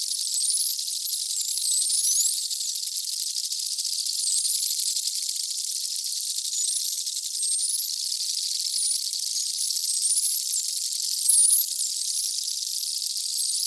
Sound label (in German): Streifendelfine